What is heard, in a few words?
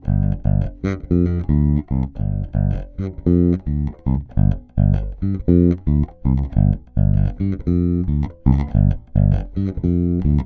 Magic Pre EBass; Fredenstein